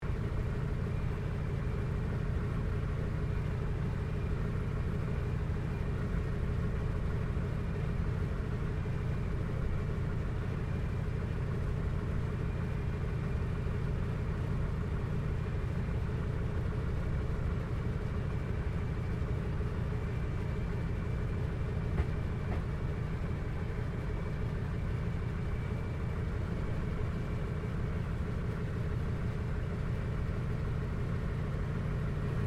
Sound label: AIRCRAFT CA-13 BOOMERANG TAXI INTERIOR; Airplane; Vehicles; Airplane Sound Effects